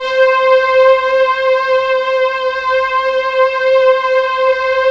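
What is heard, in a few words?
PAD Comp String; Best Service Gigapack 1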